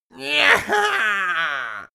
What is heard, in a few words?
imp attack